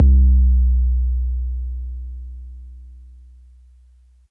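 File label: bass drum 808 MPC60 Noise B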